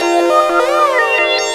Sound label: Synth, Riffs, Arps & Hits, Jungle; Blu Mar Ten - Jungle Jungle - 1989 to 1999 Samplepack